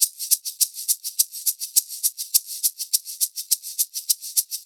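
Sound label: percussion, Roots Funk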